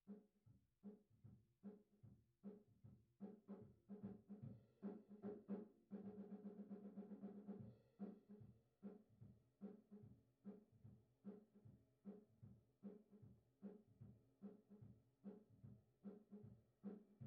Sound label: Bass Line, Ragtime